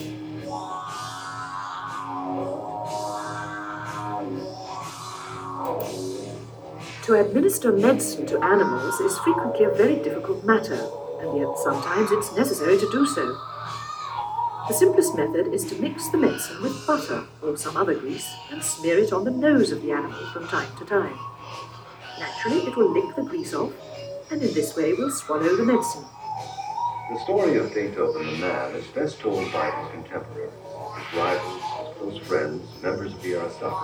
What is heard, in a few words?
music loud